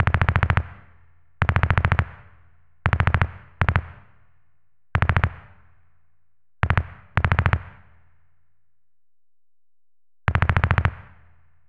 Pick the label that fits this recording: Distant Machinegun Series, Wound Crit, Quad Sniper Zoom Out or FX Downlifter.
Distant Machinegun Series